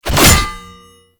Bayonet Melee Combo; TnBayonet; Warframe; Tenno; weapons

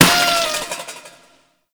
Party Balloon Pop; Gameplay; Warframe; Shadowgrapher

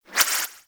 Scoop Idle Spin; Warframe; Missions; Tenno Ball

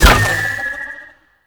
Choir Bow Fire; Warframe

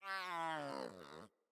Kitten Yawn; Enemies; Catbrow

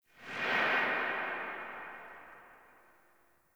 Rifle Tail Secondary; Warframe; Tenno; Tenno Bard Rifle

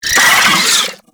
Wall Climb Movement Vocal; Warframe; Oraxia

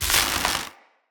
Tempest Tesla Cannon Impact Enemy

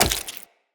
Tenno Wall Jump Flesh, footsteps, wall run; Characters

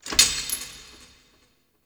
Asteroid Close Metal Hits; Ambience; Warframe; Grineer

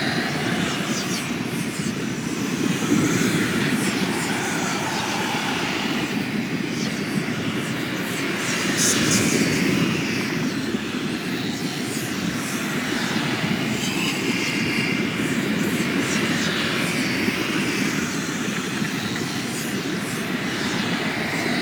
Finger Reliquary Loop; Railjack Hunt

